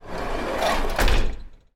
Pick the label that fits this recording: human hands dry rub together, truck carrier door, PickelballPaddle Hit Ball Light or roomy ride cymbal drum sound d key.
truck carrier door